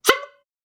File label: Bycicle Horn; SoundsCrate